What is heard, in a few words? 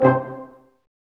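BRS, Brass Ensemble stac; Roland L-CD702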